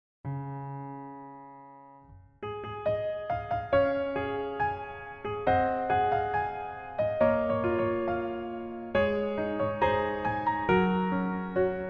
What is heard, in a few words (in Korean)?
Piano 멜로디MR